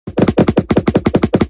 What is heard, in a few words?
better punch barrage